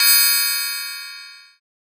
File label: cymbal, trap